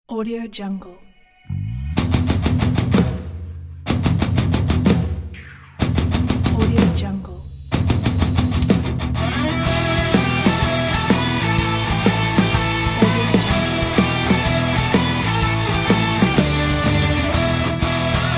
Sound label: metal radio logo ident